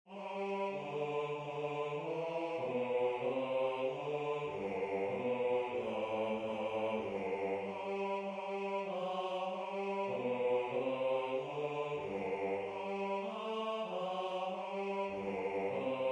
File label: Bass